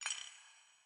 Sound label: movement run; smite; vamana